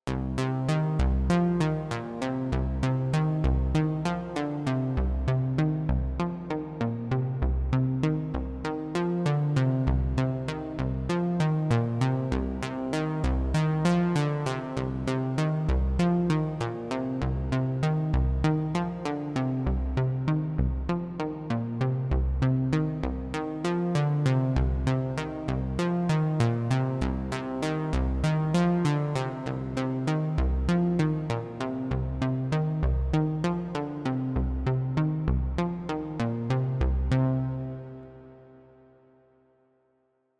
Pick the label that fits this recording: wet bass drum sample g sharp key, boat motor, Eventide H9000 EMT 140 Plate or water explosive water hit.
Eventide H9000 EMT 140 Plate